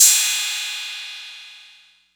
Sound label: Cymbal 808; gate experiments